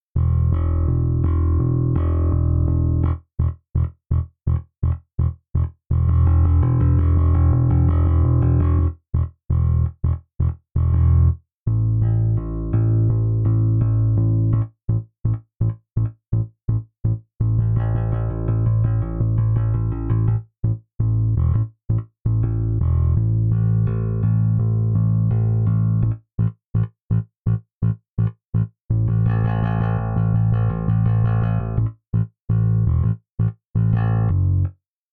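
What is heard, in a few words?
70 P Bass Default setting Finger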